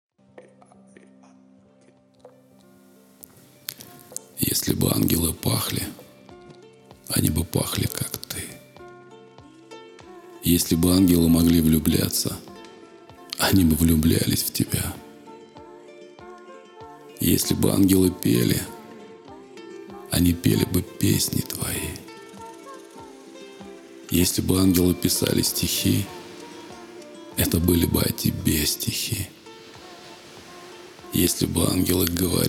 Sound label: ASMR